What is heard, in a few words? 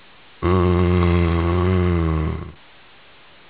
zo idle; rezombie; svencoop